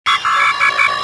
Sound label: console, ambience; kejim